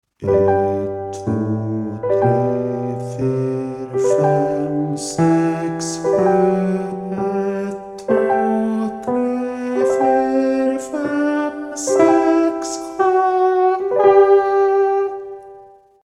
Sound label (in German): SATB Tenorlage